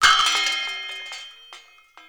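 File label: FLEXI NOISE; PlatinumPhattCD2; E-MU Producer Series Vol. 8 – Platinum Phatt; Flexis Etc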